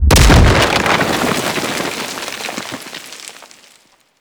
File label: Mortar Impact; Gameplay; Warframe; Tau